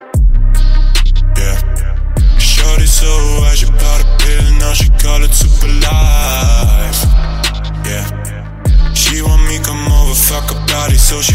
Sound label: Trap Woofer